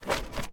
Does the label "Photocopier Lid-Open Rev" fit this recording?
Yes